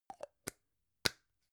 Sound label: gum plastic box close soft